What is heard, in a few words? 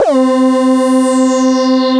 Synthesizer Middle C Notes, Lead